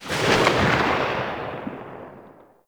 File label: Uzi Tail; Warframe; Tenno; Prime Tenno Uzi; Weapons